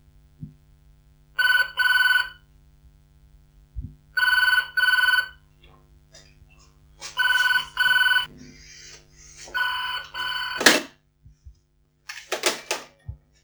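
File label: Phone ring pickup down, interior; ENV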